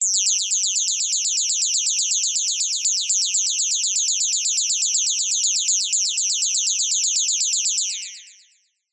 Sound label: science fiction alarm siren alert on run off high pitched; zapsplat